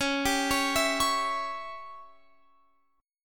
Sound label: C sharp Diminished C sharp, Guitar Open F; arpeggiated; chord; JGuitar